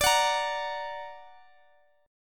A sharp Augmented D

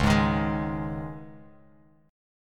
C sharp Suspended 2nd, C sharp, Bass Standard; C#sus2; strummed; JGuitar

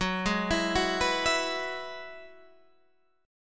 F sharp 7th Suspended 2nd Suspended 4th

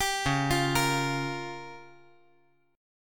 Db 6th Flat 5th Db down; Db6b5 arpeggiated; JGuitar

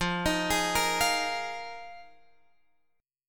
F Minor 11th Guitar Open F; Fm11; arpeggiated; x,12,15,15,13,12

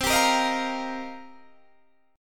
C Minor 11th C Guitar DADGAD; Cm11 strummed

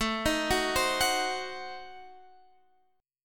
A Diminished 7th A down Guitar Standard; Adim7 arpeggiated; JGuitar

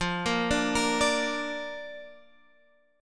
F 6th Suspended 4th F, chord; F64 arpeggiated

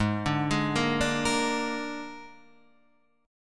Ab Suspended 2nd Flat 5th Ab, Guitar Standard; 4,5,6,3,3,6; arpeggiated; JGuitar

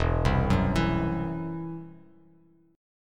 E Suspended 2nd Sharp 5th E down Bass Standard; Esus2#5 arpeggiated

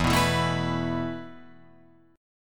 E Suspended 4th E, down, Guitar, Open F; Esus4; strummed

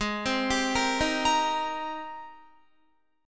Ab Major 9th Ab down Guitar 4E 4A 5D 5G 5C 6F; AbM9; arpeggiated

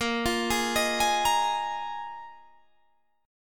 D sharp Suspended 2nd Suspended 4th; chordshape

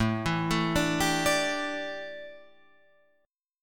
A 7th Suspended 4th, A, down, Guitar, Open F; A7sus4 arpeggiated

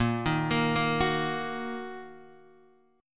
A sharp Suspended 4th Sharp 5th Guitar DADGAD

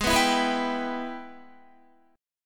Ab Minor Major 7th Sharp 5th, down, Guitar; strummed; JGuitar

